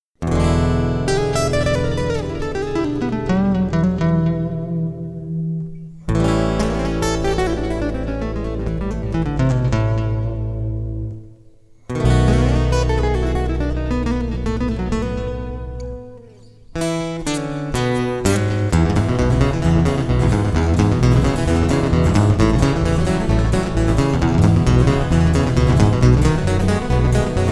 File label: Guitar Boogie